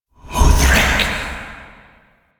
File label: Alt Fire Whispers; Weapons; Warframe; Tenno; Grimoire